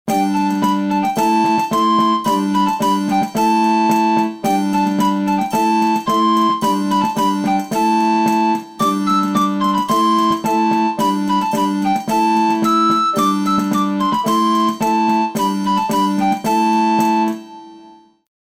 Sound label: tavern